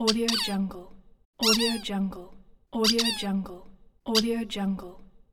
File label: squeaky toy